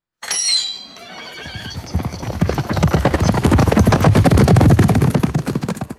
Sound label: starting gate opens and horses gallop away; ambience